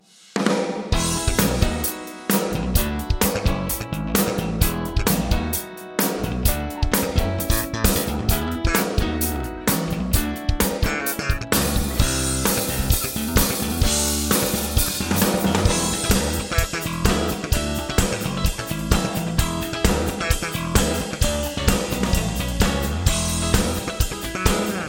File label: Sony MU-R201; Little Plate